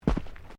Footsteps Boots Walking Track Sandy Step; Footstep Foley; Human

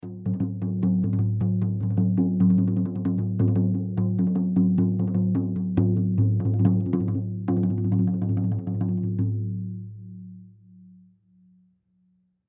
Frame Drum Rhythm; Hits; Frame Drum Rhythm Short; Blastwave

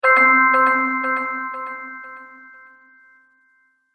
Ta-Da Chimes With Delay; Cartoon Music

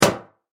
Cartoon Balloon Pop; Cartoons

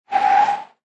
Car Or Motorcycle Brake, Tyre Screech; Auto, car motorcycle or motorbike braking, accelerate or steering screeching sound; Lynne Music

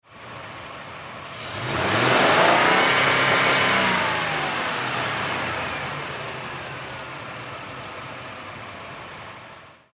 Car Mercury Sable High Rev; Power Car; Car Sound Effects

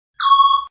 Zinger; Zingers; Multimedia

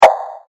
Heavy Wooden Hit; Wood Sound Effects; impact sound effect

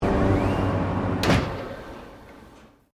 Door Servo Automatic Close, Created door sound; Miscellaneous Doors

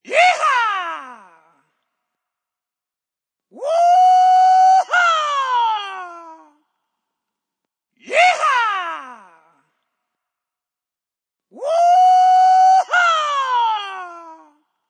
Rebel Yell; Human Vocal Ringtone

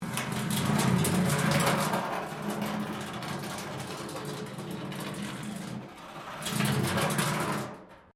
metal rubbish bin drag concrete; Materials

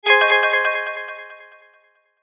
Sweeper Dull Bell Ring Shimmer; Production Element Imaging Element Accent Transition

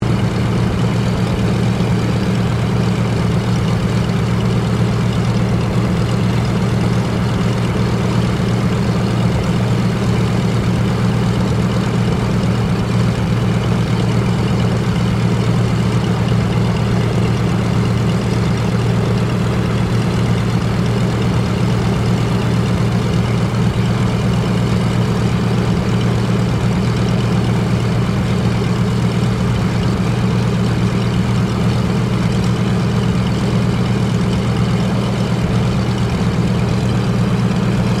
AIRCRAFT DOUGLAS DC3 IDLE; Qantas 1942 DC3; Airplane; Airplanes; Vehicles

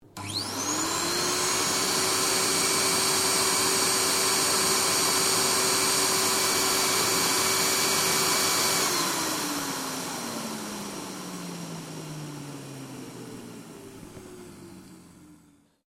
Vacuum Cleaner On Off; Dyson; Household Appliances

